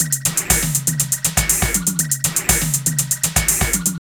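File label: drum loops, Right; Big Fish Audio; Prosonus 500 Drum Loops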